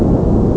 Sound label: inside jet; Amiga Soundtracker Sample Packs